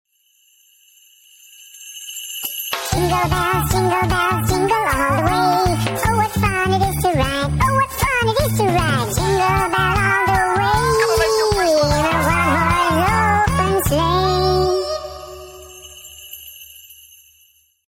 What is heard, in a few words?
sony jingle bells ringtone; funny ringtones